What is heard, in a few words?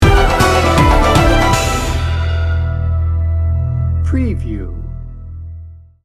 celtic hero sting bumper